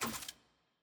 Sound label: record next click; caitlyn